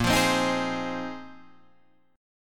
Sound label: A sharp 7th Suspended 2nd Suspended 4th, Guitar Drop D chord; 8,8,10,8,9,8; down